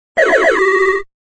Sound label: Video Game Sound, Video game sound